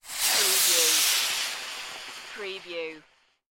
Rocket Launch Pass By; sound effects bible

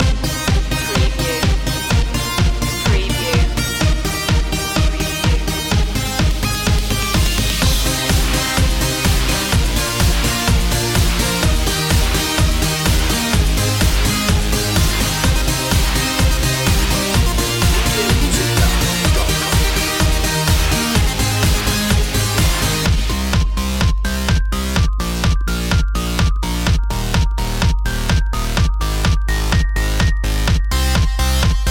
arena synth long looping

